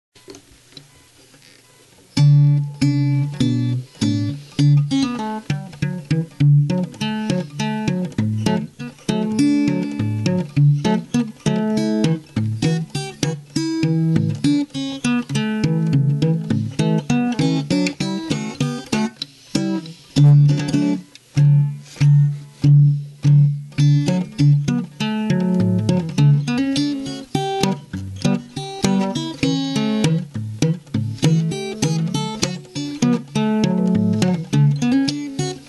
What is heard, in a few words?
Instrumental; Ain Kitzva